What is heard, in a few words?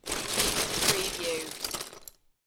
Lego, grab handful of lego from lego box